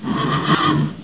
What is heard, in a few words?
horse; wanted